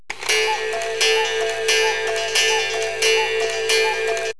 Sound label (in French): 6 coucous, horloges